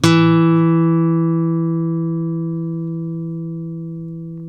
ACUSTC E 2, ACOUSTIC guitar; East West; Steve Stevens Guitar Samples Collection; ACOUSTIC PCK

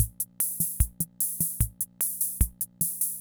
Kent K-220 Rhythm System Loop, drum machine

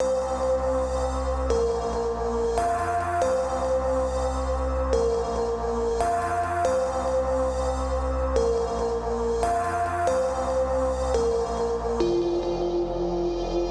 Synth Riff C Bb F, 140bpm; Zero-G Sound Sense Grime Scene; GS Construction Kits; Astoria; 90 s Sample CDs

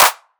drum clap simple; Virtual Riot; Drum Hits

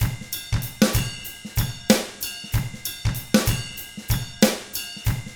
95 FUNK, Drums; ILIO; Double Platinum Drums 1

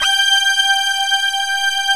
PERC 5ths

